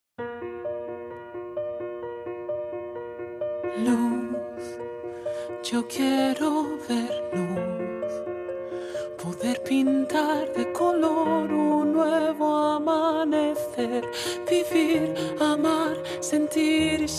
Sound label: dancing in the rain bass